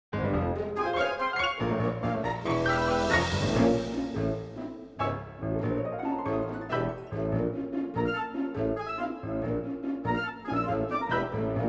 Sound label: Roland FANTOMS